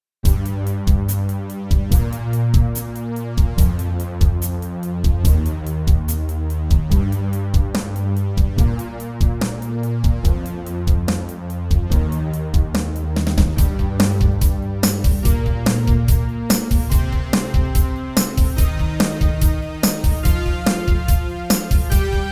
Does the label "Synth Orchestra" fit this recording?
Yes